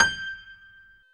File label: PIA GRDPI, PIA_GRAND, Roland; Sound & Vision; Gigapack I CD 2; PIA Grand 5